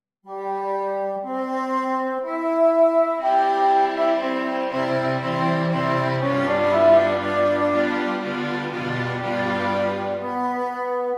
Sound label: Quartet